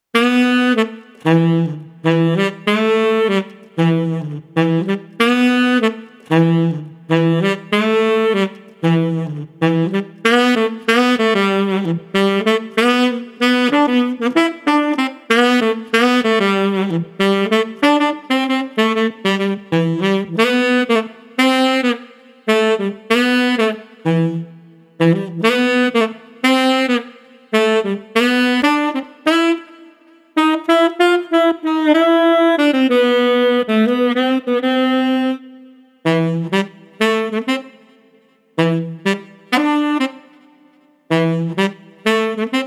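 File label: Street Tenor Sax Spirited, 95 bpm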